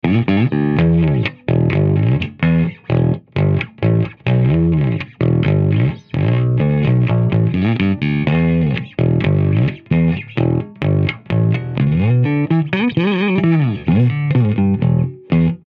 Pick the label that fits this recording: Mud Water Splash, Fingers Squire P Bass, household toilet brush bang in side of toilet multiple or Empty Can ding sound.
Fingers Squire P Bass